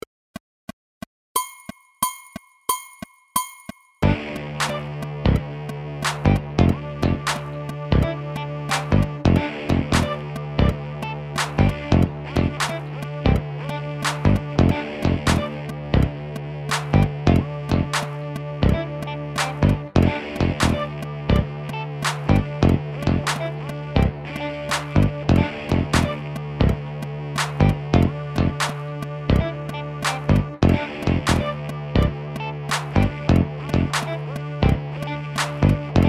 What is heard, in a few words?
jazzy groove shuffle loop